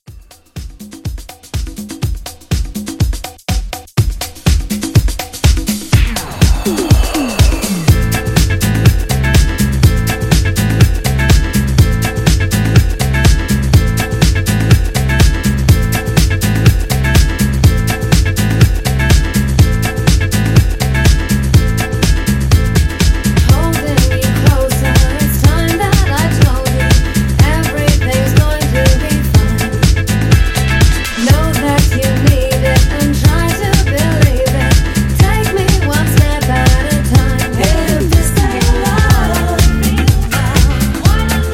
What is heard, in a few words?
Disco Re Drum